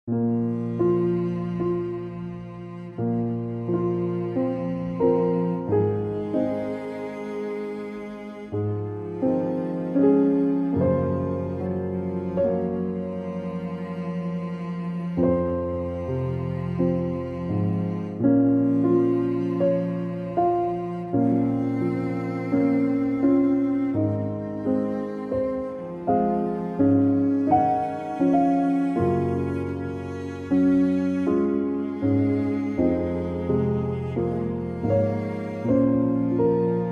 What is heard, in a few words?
MEMORIES CELLO